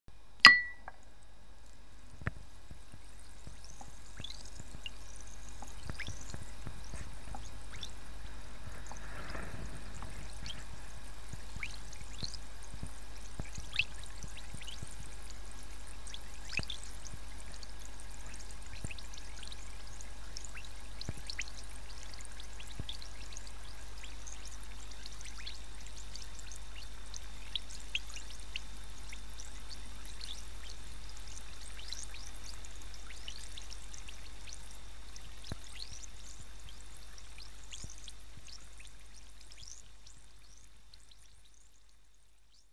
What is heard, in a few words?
Effervescent Tablet